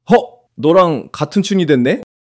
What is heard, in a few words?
surprise